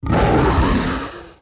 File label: Hybrid Growl, Vocals - Monster; Sony Pictures Sound Effects Series